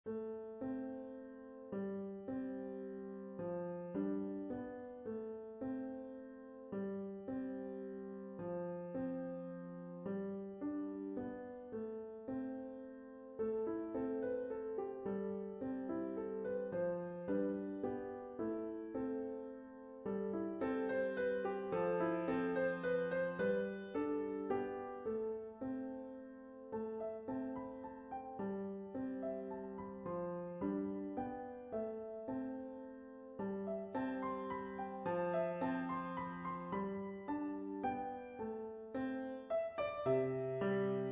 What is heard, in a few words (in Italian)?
pianoforte